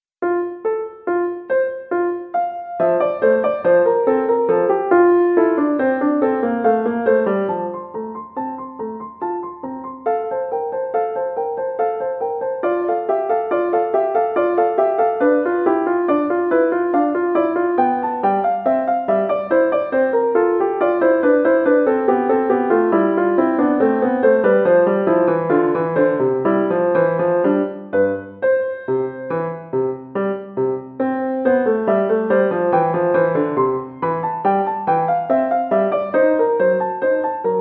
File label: inventions a 2 voix piano; bach johann christian; 15 inventions